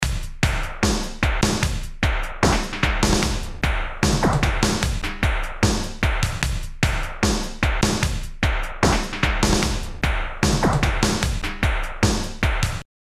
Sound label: Laser Beat, Drum Loops; Industrial